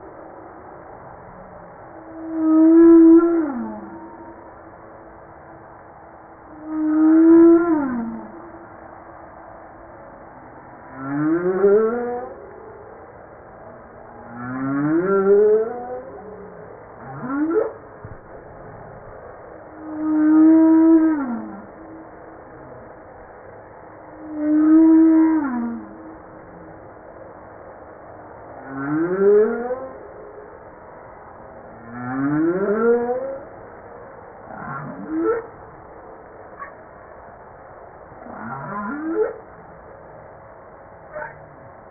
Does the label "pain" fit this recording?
No